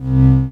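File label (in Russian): кнопка цифровой одиночный мягкий низкий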